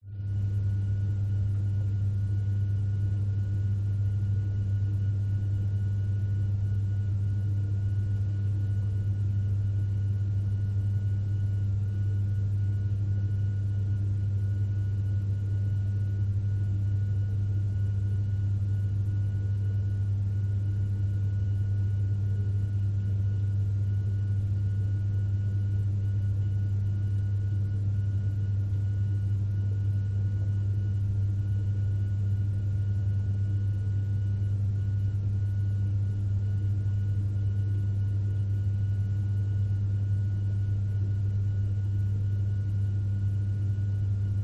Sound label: Ship Cruise; audio hero